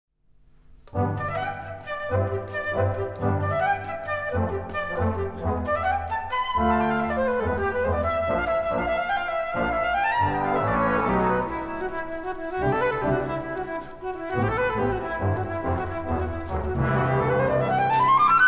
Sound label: Flute concerto